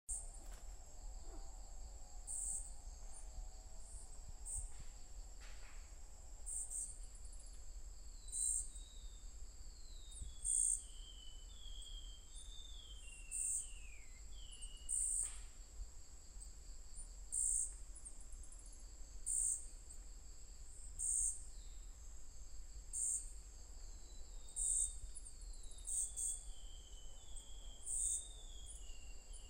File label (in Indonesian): suara anis enggano